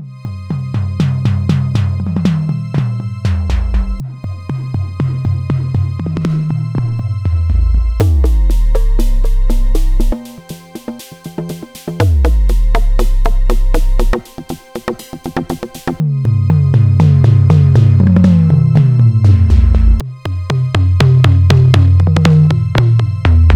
timbal perc

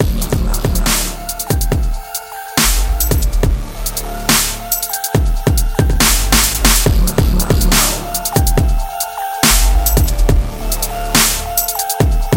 dubstep

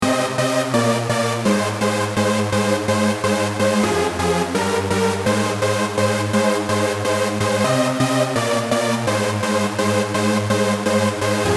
dutch lead

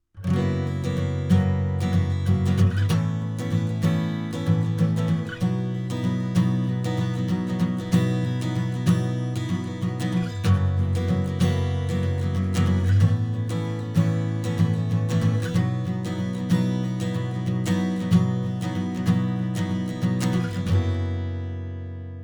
shiny acoustic; ikmultimedia

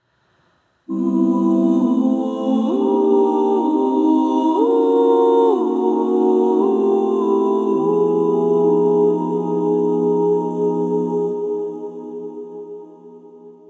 nightingale mode d

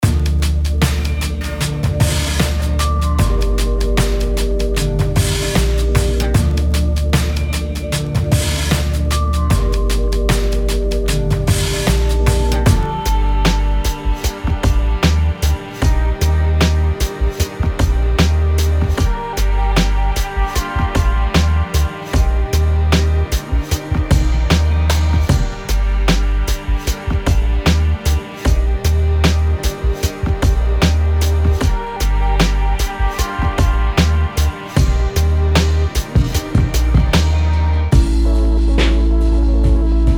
downtempo vinylistic breaks

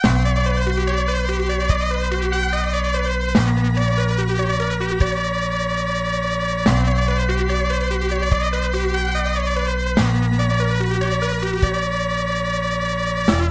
key warbling vox

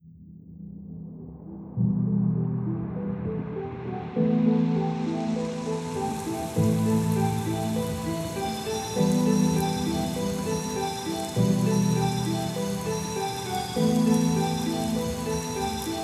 vaporwaves

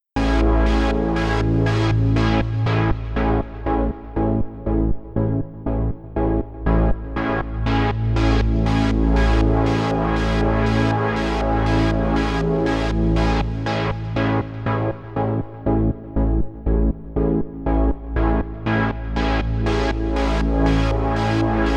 hold key riser

